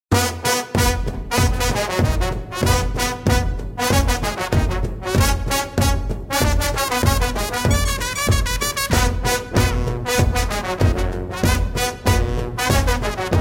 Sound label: Brass Band